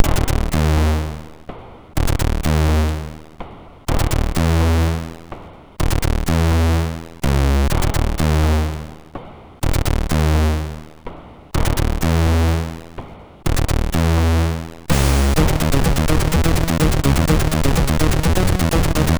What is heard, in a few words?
MOS6581R2, sid